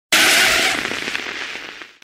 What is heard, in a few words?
scratch